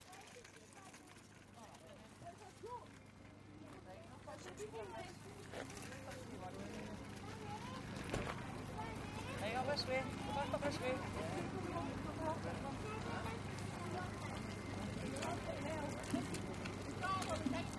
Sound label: car boot sale binaural soundscape ambient sounds